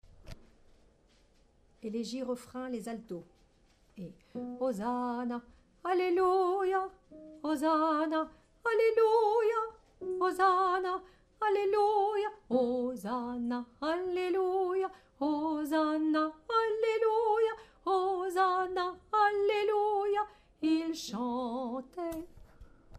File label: Alto